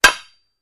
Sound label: Metal Impacts, Dull; Dull Impact With Medium Thud. - Dish Impact; pipes